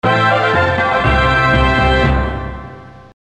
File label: Announcement fanfare